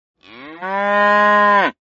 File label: animals cow; mace